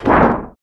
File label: Foot Duct, Footsteps